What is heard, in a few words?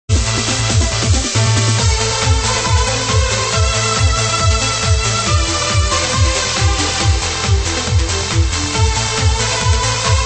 Melodic Trance Mix